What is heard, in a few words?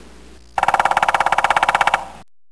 SHOOT, 射击; 战争; 特酷声音素材库